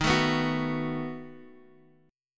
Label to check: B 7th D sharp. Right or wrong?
Right